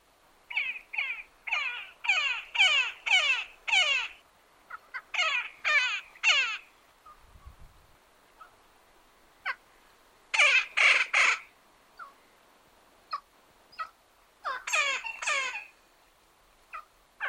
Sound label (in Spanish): Carpintero, sonidos de la patagonia